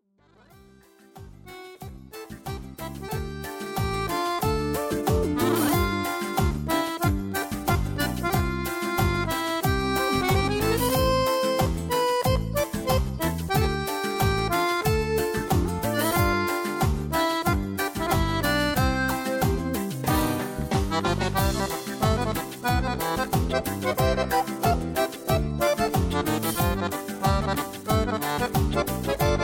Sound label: cumbia